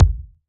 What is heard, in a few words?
Ball Collision Geo Tackleball Hard